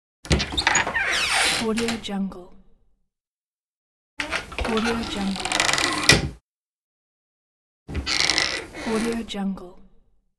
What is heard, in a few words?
creaky door